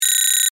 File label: Data Readout Beeps, Scifi; Tech; BlastWave